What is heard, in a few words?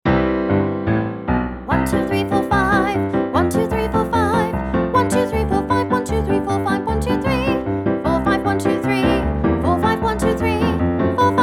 Vocal Warm Ups 1, 2, 3, 4, 5; Vocal Warm Ups Volume 1